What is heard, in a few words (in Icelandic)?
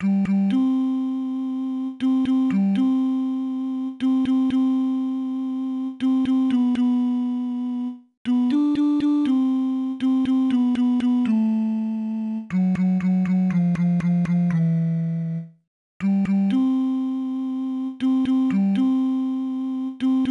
tenor